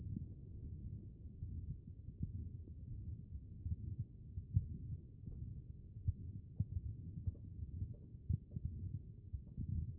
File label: HEART B MODE